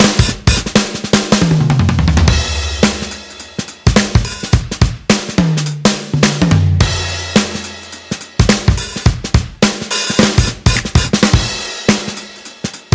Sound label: Only Drums Jazzy Hip Hop; calm drums